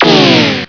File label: TIN